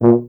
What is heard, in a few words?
BRS TUBA, Solo Instruments